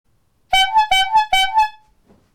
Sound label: loud Bright Squeeze Horn